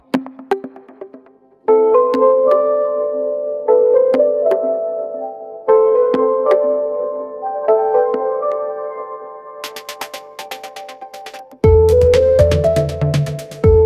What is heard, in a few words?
upbeat pop melody catchy hook; ai